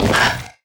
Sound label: fs fm plywood, footsteps; Portal 2